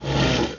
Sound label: Mawlek Call; Hollow Knight (gamerip) (2018)